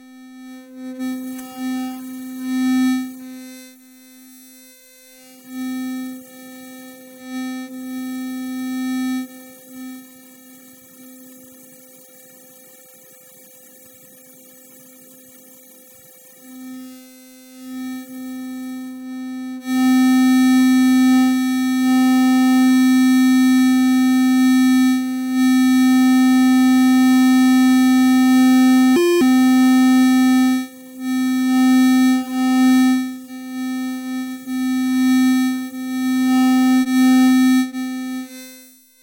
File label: EMF sonic toothbrush phased tone constant; Soundholder